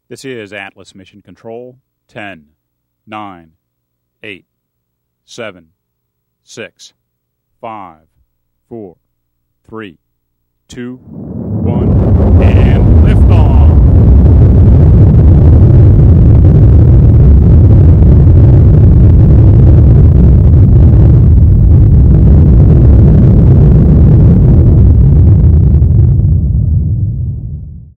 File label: atlas launch